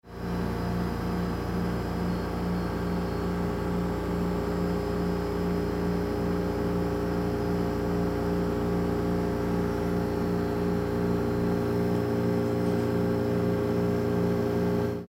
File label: machine ambience